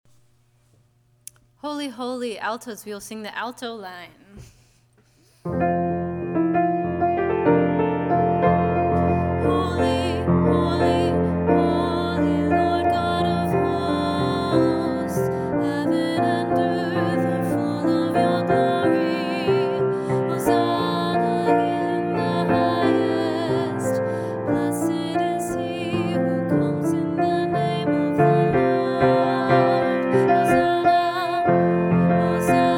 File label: Holy Holy Alto